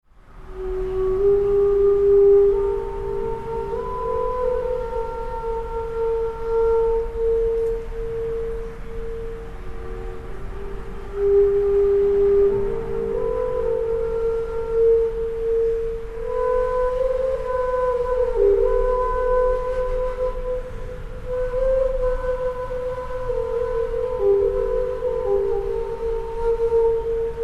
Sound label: flute